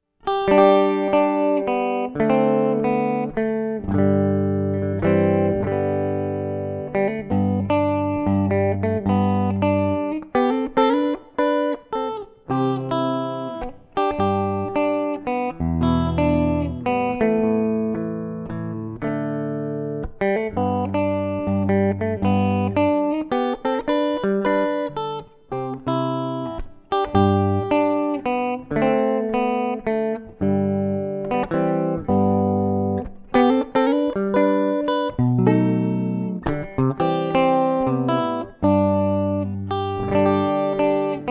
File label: solo instrumental